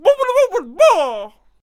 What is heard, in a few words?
Potionseller Attack Vocals